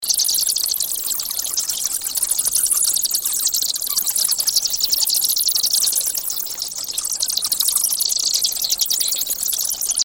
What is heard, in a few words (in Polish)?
nietoperz; Dzieciaki Ratują Zwierzaki